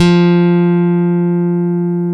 CHAP STICK, F 4; East Collexion - Bass S3000

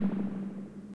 Tails, Drum Bits, Verb Tails

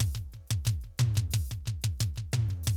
Classic DrumLoops

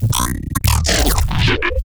glitch stab; Virtual Riot; Glitch Stabs

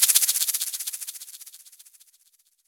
Egg End Off, shakers

